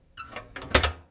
Vehicle Hatches; Red Orchestra Ostfront 41-45

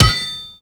punch iron

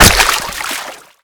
Projectile Sounds; Red Orchestra 41-45

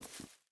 footstep rubber boots grass jump start; Rust

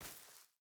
footstep barefoot grass walk; Rust

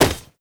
hit grab knife; Call of Duty Modern Warfare

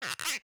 bota bag cork squeak; Rust

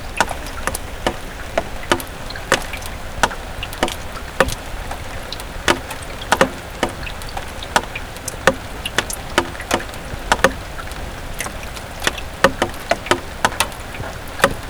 Amb Weather; Red Orchestra Ostfront 41-45

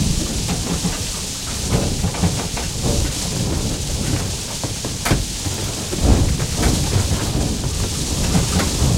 air stall loop, engines; War Thunder

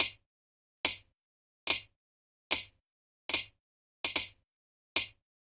F-86 spark plugs, aircraft; DCS

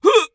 hit; Escape from Tarkov; scav5

